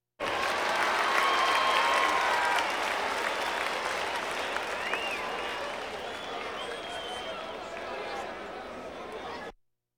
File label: Crowds Race cars Baseball